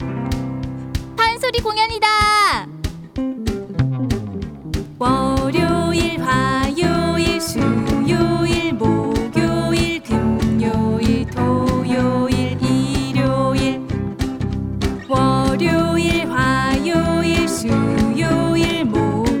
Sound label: song korean